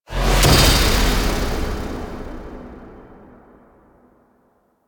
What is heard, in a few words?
Speedball Spawn; Warframe; Missions; Tenno Ball